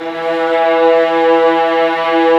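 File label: violas, ambience, mezzo forte; String Master Series; Vlas Sect3-4; Amb mf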